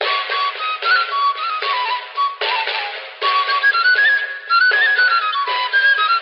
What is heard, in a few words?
flute, Loops Phonky